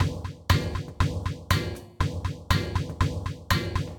Kit, Drum Racks; Eggs of Satan; Ableton; Factory Packs; Mood Reel

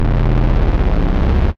Grunge and Glide, Wavetable, Bass; Instruments; Ableton; Synth Essentials

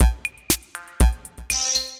Kit, Effected Drum Kits; Drum Machines; C78; Ableton; Factory Packs

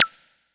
kid Glitch tweek; Ableton Foundation FreePacks; Wicked Kits

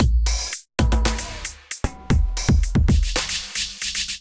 Kit groove 114 bpm; MIDI Clips; Powered Kit groove; Ableton; Factory Packs; Drum Essentials

